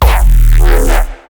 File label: Reese; neuro; BIG5AUDIO